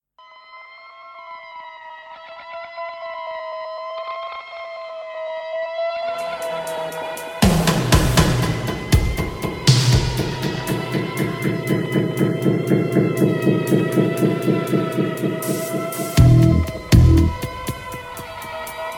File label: Dub Double Echo Remix